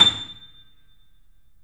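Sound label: A6, Bösendorfer, 3D Pianos; E-MU Producer Series Vol. 5; 3-D Audio Collection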